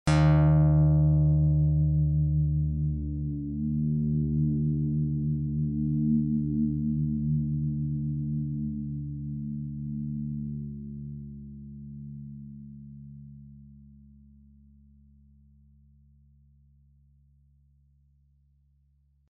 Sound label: lyre